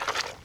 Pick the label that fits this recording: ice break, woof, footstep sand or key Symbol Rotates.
footstep sand